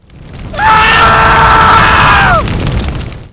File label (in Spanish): grito quemando; severance